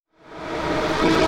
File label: Bio Plasma Beam Charge